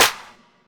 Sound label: hand clap a sharp key; percussion; single hits one shots